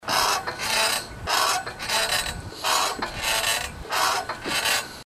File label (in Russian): kacheli